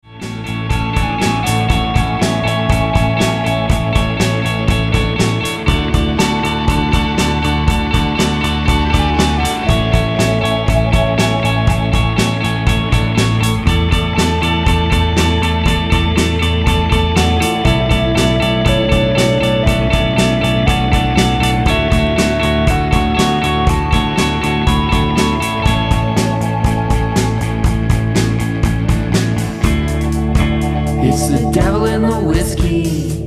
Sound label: drum